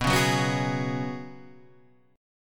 F sharp Suspended 2nd Suspended 4th B

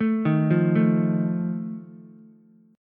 D sharp Suspended 2nd Flat 5th, Ukulele; arpeggiated; JGuitar

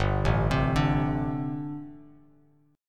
G Suspended 2nd Suspended 4th, Bass Standard; Gsus2sus4 arpeggiated; JGuitar

